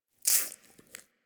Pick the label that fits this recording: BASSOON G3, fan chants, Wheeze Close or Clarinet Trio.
Wheeze Close